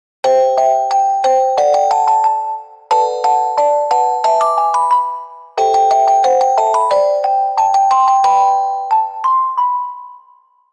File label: ring